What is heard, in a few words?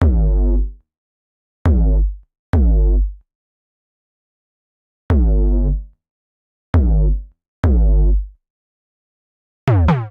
Bass Gm [95 Bpm]; MIDI Clips; Music; Detonte; Ableton; Factory Packs; Vibrations; ModeAudio